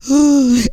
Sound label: REGGAE, Vocal Planet World Voices